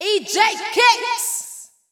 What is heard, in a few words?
VOICE GRL HOUSE 125, House Voice; eJay